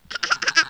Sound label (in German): kichern